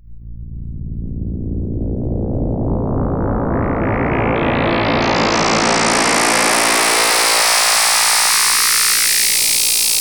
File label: DISRUPT, ATMOS; AKAI Anime Sound FX